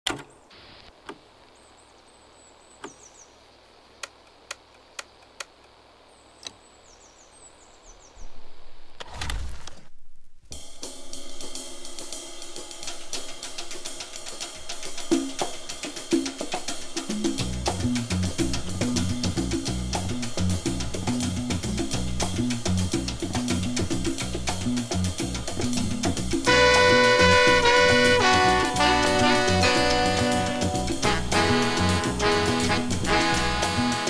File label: Stepping into Deep Jazz Dance Territory